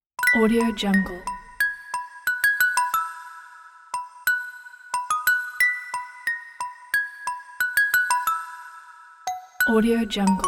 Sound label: happy kids